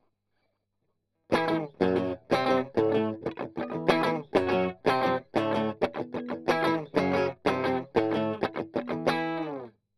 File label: Strum Octav